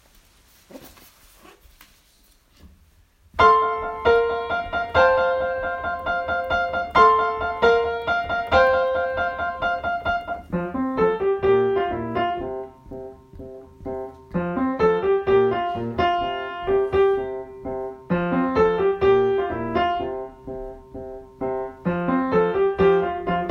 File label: melody fast